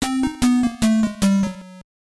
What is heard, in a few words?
Bonus Stage Fail; Hello Kitty World; nes